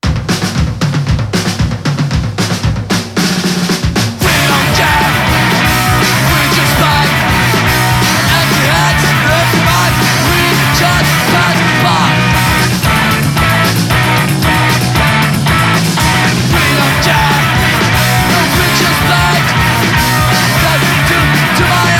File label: Electric Guitar